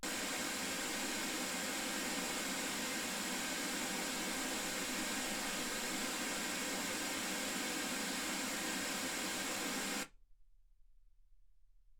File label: wgn